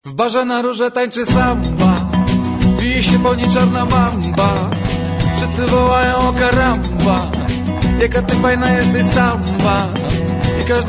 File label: samba